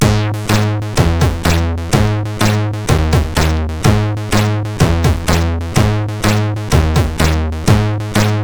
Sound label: sid CSG8580R5; Rocky-Mail 2 tune 1